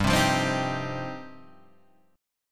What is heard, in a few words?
G 7th Suspended 4th Sharp 5th, Guitar Drop D